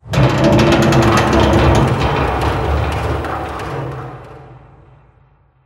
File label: ambient industrial metal ticks